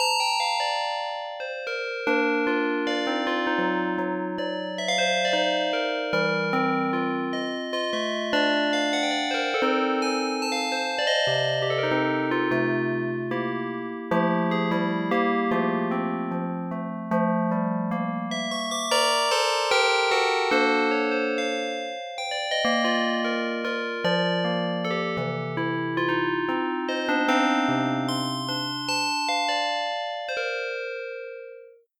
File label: Fmaj